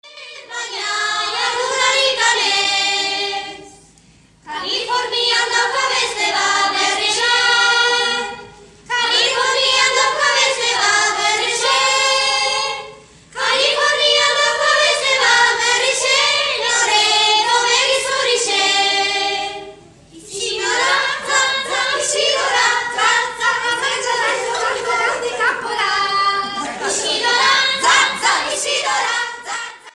Basque

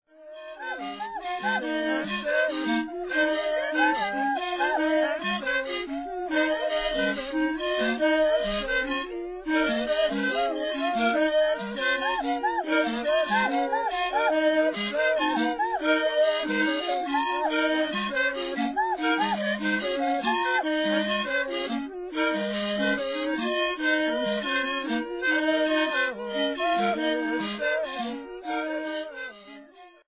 Ouldeme Pipes; Mandara Mts; Zavan

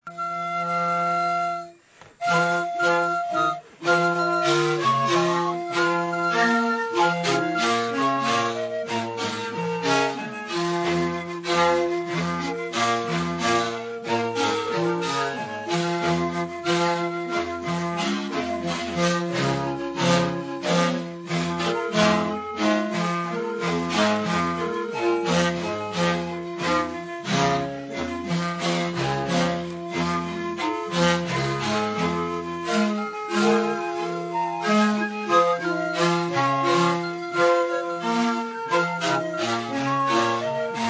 The Sound Of Bamboo; Areare; Solomons; au ripi

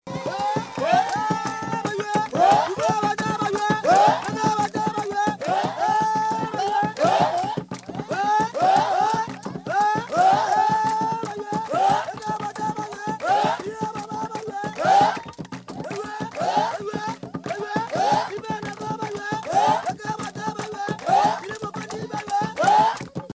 Aka Shouted Hocket

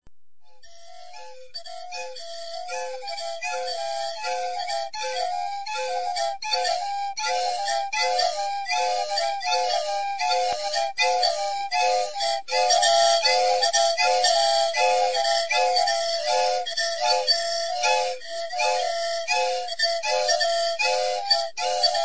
Madagascar Mikea Country Kiloloky Pipes; Ocora

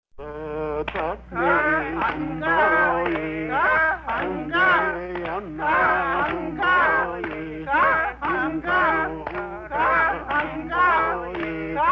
Ainu Ritual hocket

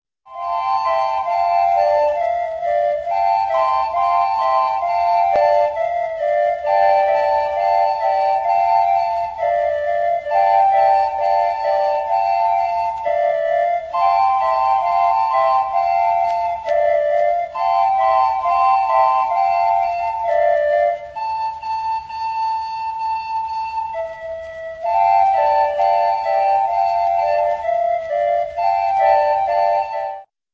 Pipe Ensemble Tututis from Lithuania; Ocora

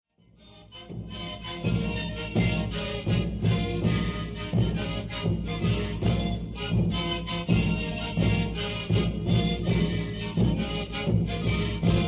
Bolivia Panpipes; Kacharpaya; Kantu

